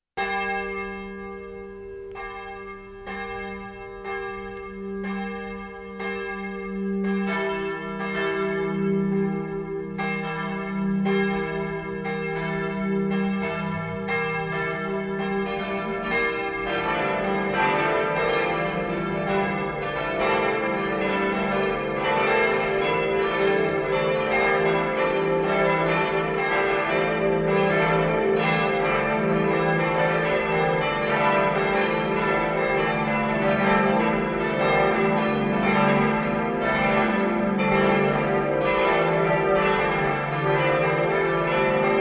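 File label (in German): Tonaufnahme d1 e1 g1 a1 h1 d2, mehrstimmige geläute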